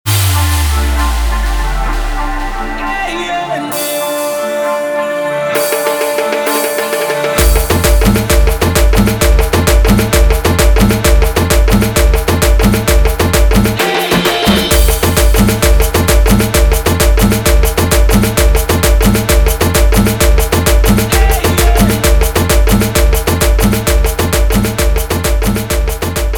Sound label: guaracha remix 131 bpm